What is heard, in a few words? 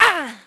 GRUNT, VOICE; Pulse Racer